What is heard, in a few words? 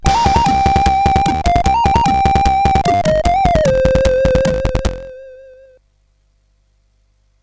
sid, CSG8580R5; Gunfright